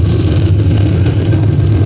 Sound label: door; svencoop; shaolin